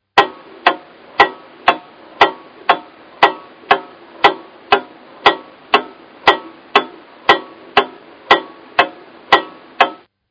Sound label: Wall Clock; Clocks; Machines